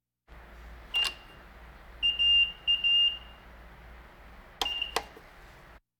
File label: Hospital effects